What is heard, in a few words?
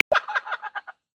Laughing Kookaburra, Australia; Xiaomi Redmi-Note-8-Pro